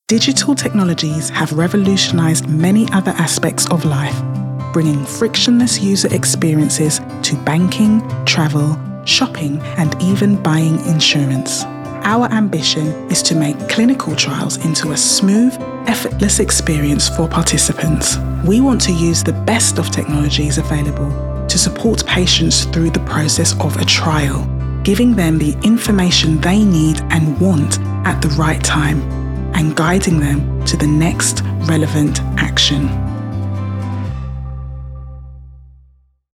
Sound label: Corporate